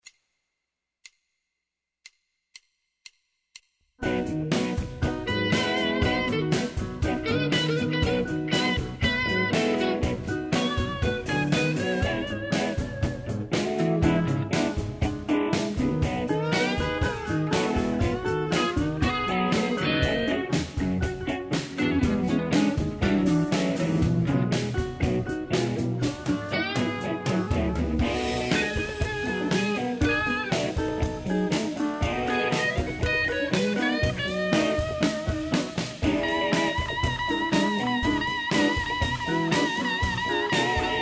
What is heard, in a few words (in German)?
Blues Playalong, Bass; Bass lernen Einführung